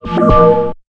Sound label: ForceField plus1; We Are the Dwarves